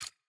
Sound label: hover; map difficulty